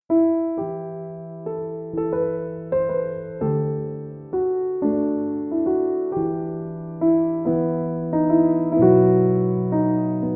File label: Grand Piano Version; What Child Is This